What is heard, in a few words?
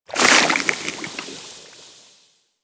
Poison Hit Effect; Warframe